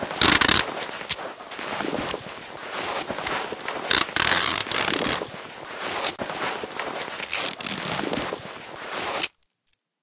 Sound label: bandaging, survivor heal